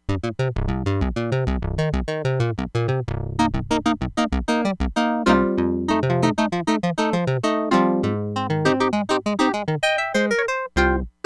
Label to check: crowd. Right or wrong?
Wrong